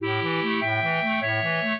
clarinet; Mozart

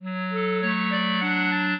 minuet, clarinet; Mozart

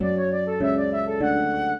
trio, flute harp; Mozart

